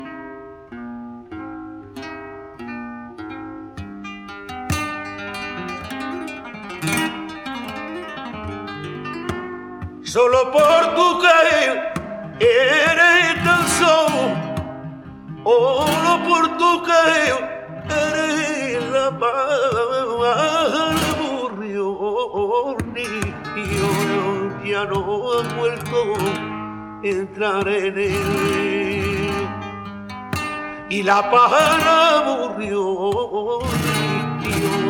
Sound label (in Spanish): Solea, flamenco; del Mellizo